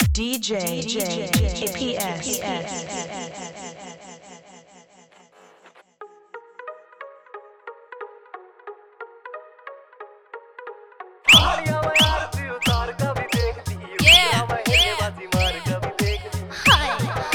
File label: Dj Remix New Haryanvi Dj Song